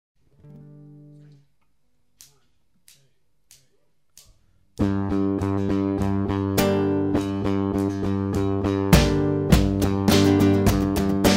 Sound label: Acoustic